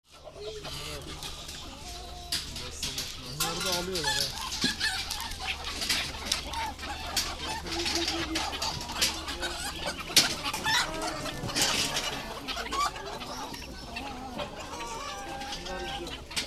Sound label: Animal market ambience chickens and birds